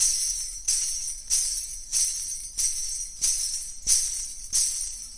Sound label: coin belt, drum loops and breaks, 93 bpm; 091 to 100 bpm